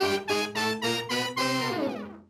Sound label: Game Over; Race On!